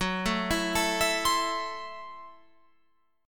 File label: F sharp Minor 7th Flat 5th F sharp Guitar Open F; arpeggiated